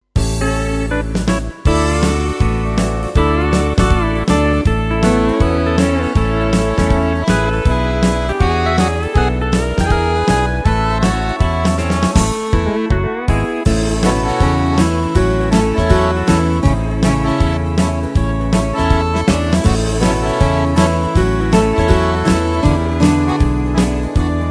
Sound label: Country Swing